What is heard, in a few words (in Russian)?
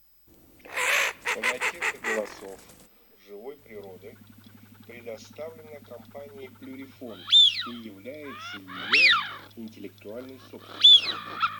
Свиязь Fischione; plurifon